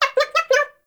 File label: baby vocal singing